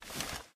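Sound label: inventory pda off; STALKER; IWP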